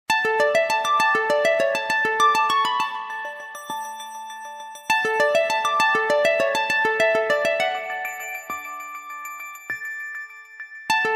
lg ringtone

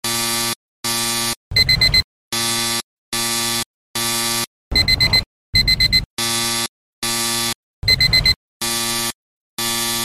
samsung alarm ringtone

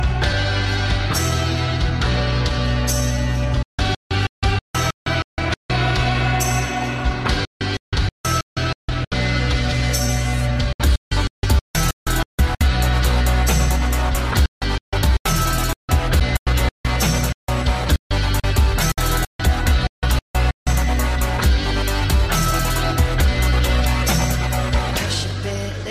redmi note 9 pro ringtone

